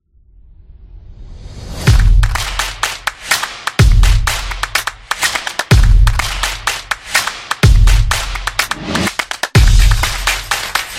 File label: Sport Action